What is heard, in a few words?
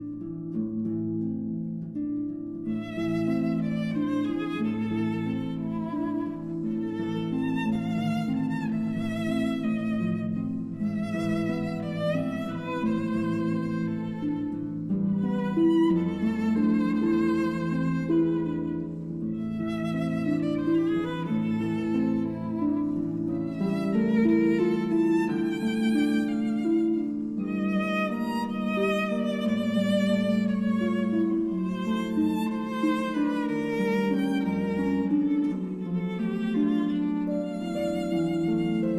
Berceuse, for violin and harp